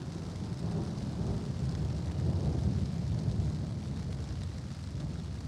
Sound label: Ambi Loop, outdoor samples Ambience Loops